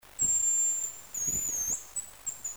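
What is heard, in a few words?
hazel grouse call; Крик; Краткие сведения об основных охотничьих животных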